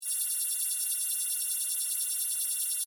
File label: Scanner Beam Ray, Scifi; Tech; Sound Effects:Science